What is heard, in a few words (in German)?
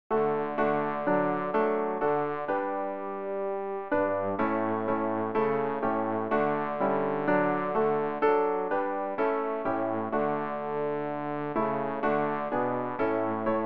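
bass, Übehilfen für das Erlernen von Liedern; ref gesangbuch; rg 239 gelobet sei der herr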